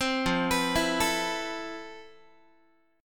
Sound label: F Major Sharp 11th, Guitar; FM#11; 15,13,14,14,13,x; 4A,4E,5A,5D,5G#,6C#; arpeggiated